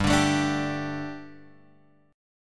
G Suspended 2nd G, down, Guitar, Open F; Gsus2 strummed; JGuitar

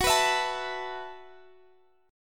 E Suspended 2nd Suspended 4th F sharp

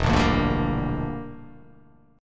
E Minor 7th Flat 5th E

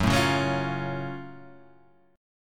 Gb Minor Major 7th Gb down Guitar Half Step Down

